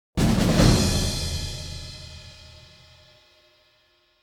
trans loud, epic battle, Orchestral Background Music; Epic Adventure